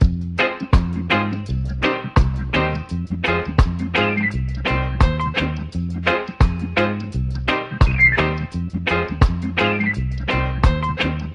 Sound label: piano reggae, Loops; Phonky